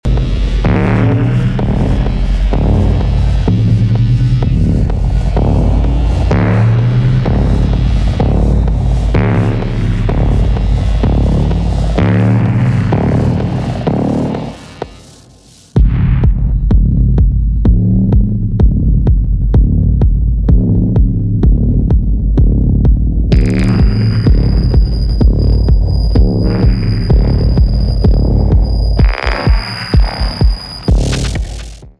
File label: noise machines